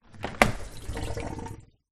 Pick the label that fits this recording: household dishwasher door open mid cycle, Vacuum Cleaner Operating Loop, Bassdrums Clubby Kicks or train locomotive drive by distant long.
household dishwasher door open mid cycle